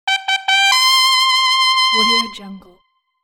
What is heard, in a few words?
mexican trumpet finale